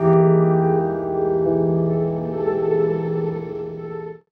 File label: Piano Dark, Drum N Bass, Keys Pads; Sonic Foundry (Sony Creative Software); Knutrix Drum & Bass